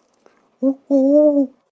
owl

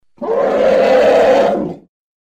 dragon

